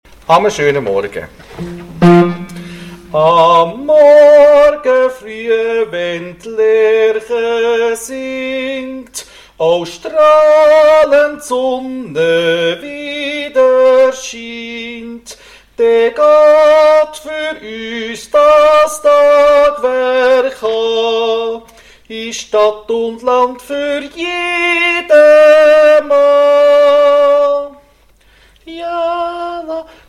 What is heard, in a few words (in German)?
2. Tenor